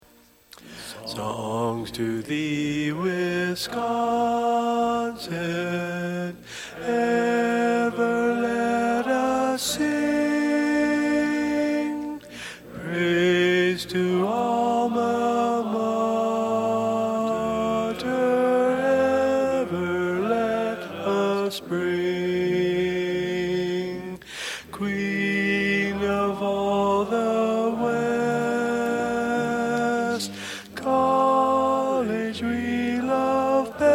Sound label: Tenor, Learning Tracks